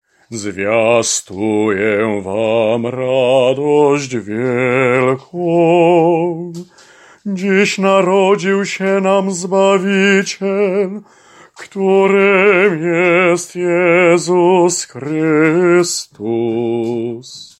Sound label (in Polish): Alleluja Pasterka BAS